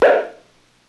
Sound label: zap, Pop, Misc Percussion